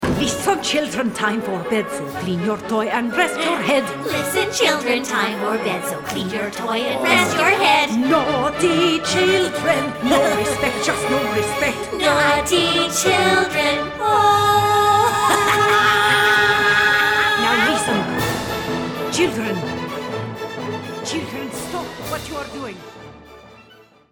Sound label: March Band vocal